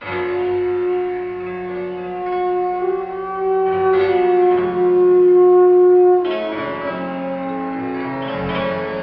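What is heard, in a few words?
horns and piano, Sad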